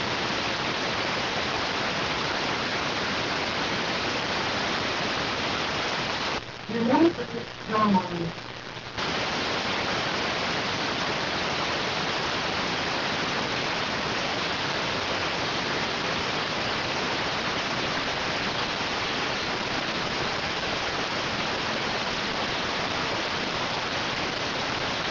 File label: rtty rx